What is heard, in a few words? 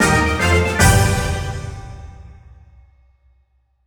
Big Win End; Santa; netent